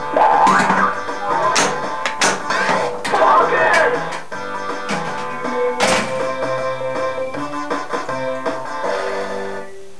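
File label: Ball drained and bonus awarded, pinball; FOCUS!; Creature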